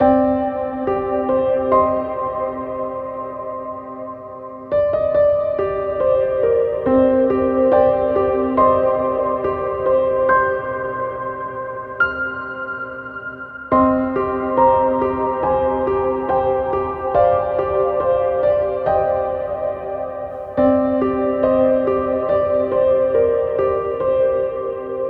Piano - Cm 70BPM; Construction Kits; STEMS; Ghosthack; SkyRealm; Kit 19 - Ode To Daenerys

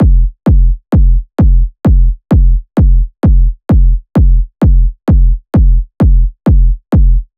Kick Loop 130, Techno

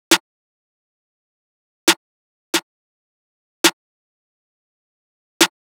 Drum Loop - 136 BPM Snare; Loop Stems; Cymatics; Vibes II Drum Loop Collection; Zenith

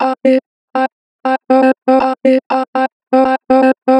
Chop - 2 bar vib, Natural Vibrato, Vocals; Harmor Vocals

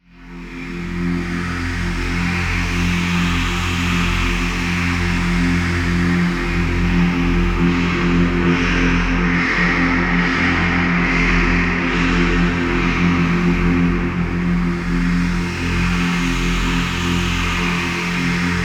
Atmosphere F 142 BPM, Psytrance; Motta; Ghosthack; Psytrance Visions Volume 2

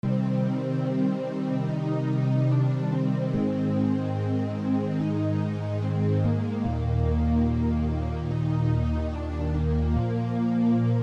Orchestral Mix with Choir; by ETM

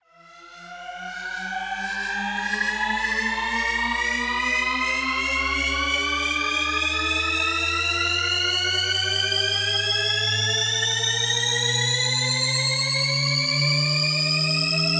Tension Riser 128 BPM E; FX; Risers; House

